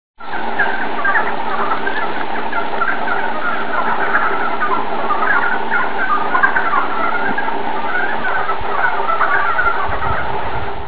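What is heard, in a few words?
yamaakagaeru, mountain red frog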